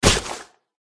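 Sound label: spider attack contact up; pauchiha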